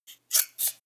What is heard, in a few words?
scissors cut paper